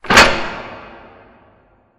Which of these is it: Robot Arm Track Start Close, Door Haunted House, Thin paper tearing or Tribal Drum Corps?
Robot Arm Track Start Close